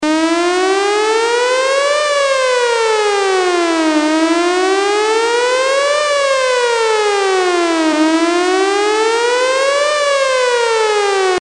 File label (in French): Sirene PPI Alerte Nucleaire